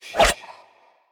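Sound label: Dagger Attack Straight; we are the dwarves gamerip 2016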